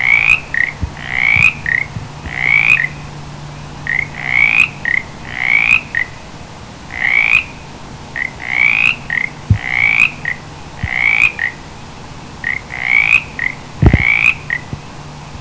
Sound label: mountain chorus frog; frogs